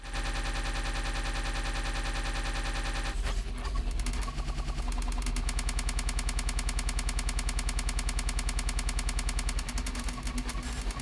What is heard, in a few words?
pitter patterrr